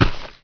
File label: Knifethrower Feet; Playable Tutorial Level; TR2